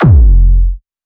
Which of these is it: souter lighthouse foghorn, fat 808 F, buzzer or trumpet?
fat 808 F